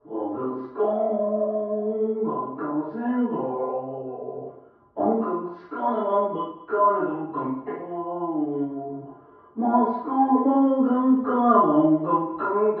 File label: sing diving bell